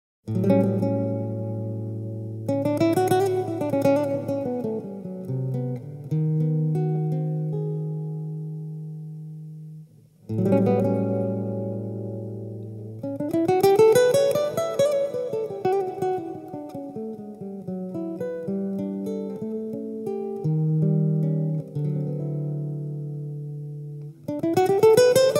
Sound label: Blues